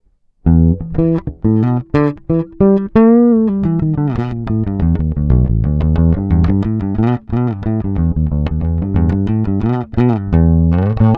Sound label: Flatwound P Thump DI